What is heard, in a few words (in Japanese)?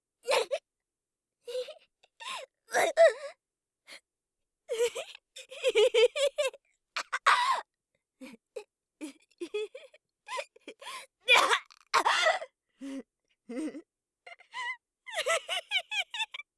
殴られも笑う; 効果音なし